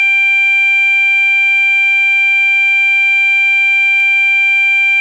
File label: tone 500 continuous